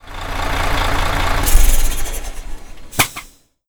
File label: stop; lwcars